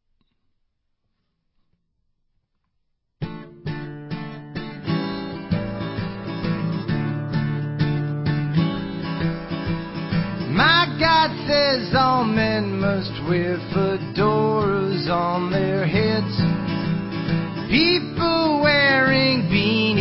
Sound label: Acoustic